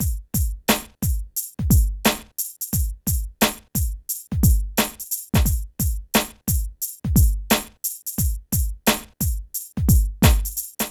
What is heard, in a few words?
PHATT BEA; E-MU Formula 4000 Series Vol. 1; Hip Hop Nation; PhattBeats88-90